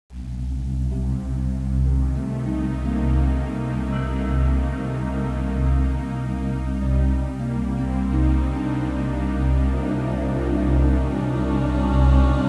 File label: Very Scary